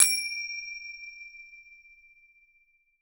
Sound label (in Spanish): Triangulo, percussion reverb; MARKYDRUMS